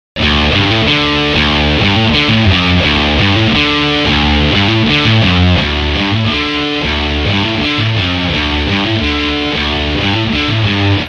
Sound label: adt guitar